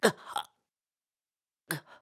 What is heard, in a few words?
Die; BOTW; Breath of the Wild; Link